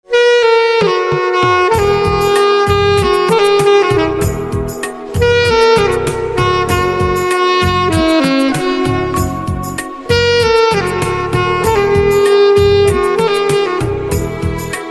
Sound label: Saxophone